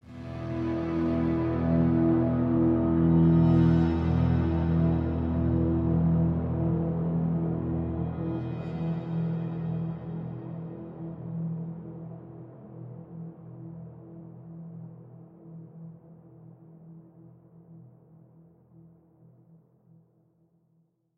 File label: Combat Stinger; Dreissk 02; State of Decay